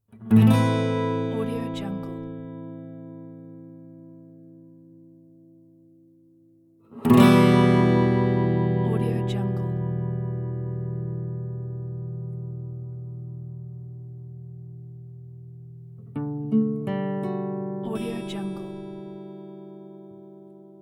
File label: guitar strum chords